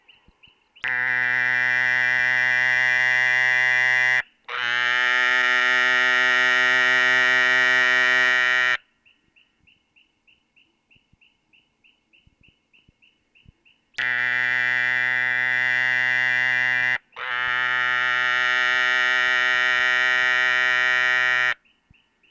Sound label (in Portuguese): Dermatonotus muelleri canto anuncio